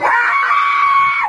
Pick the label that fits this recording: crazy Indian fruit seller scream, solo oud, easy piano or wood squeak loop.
crazy Indian fruit seller scream